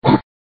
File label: whack; netzero